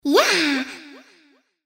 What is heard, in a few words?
moan; Imperial Girls